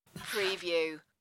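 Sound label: Wallet Slide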